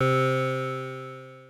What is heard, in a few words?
synth poly c2